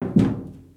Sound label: step metal soft; OneShot Fading Memory